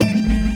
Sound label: FX, Futurist Drum & Bass